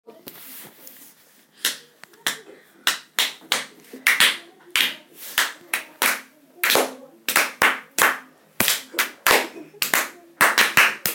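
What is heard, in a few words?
clapping piece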